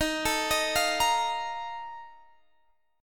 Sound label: A sharp Major 7th Suspended 4th